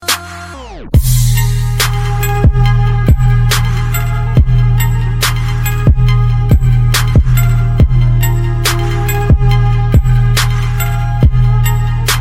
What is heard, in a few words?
arabic bass ringtone